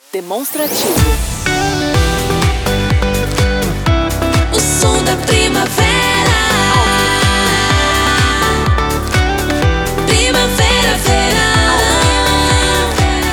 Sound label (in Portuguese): vinheta primavera, vinhetas cantadas; PACOTE PRIMAVERA VERAO 2025; ALVIM PRODUTORA